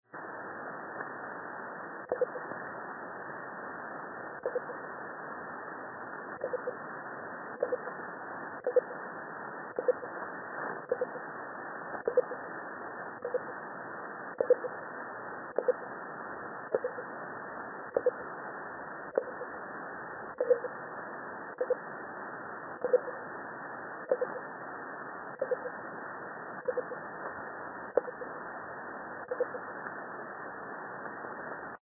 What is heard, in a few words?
24905kHz triple echo